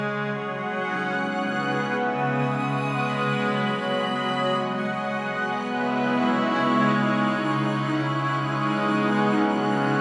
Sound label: mood loop sad; spirit blossom